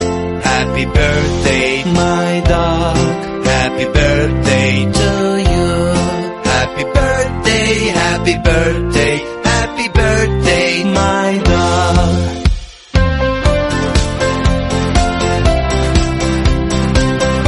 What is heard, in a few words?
Happy Birthday My Dog Birthday Song English